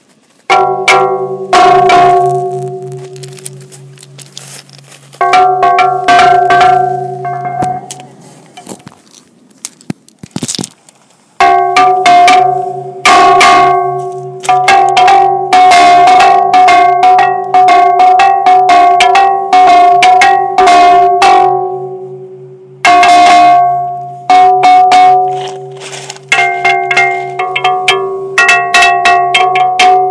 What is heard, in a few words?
beach music; cerdd traeth